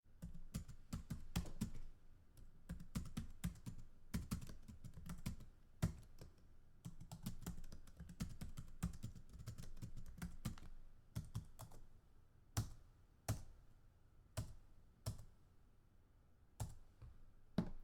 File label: typing on keyboard